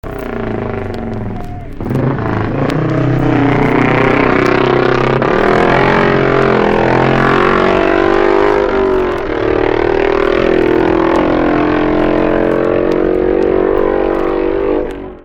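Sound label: Manx Norton, motorcycle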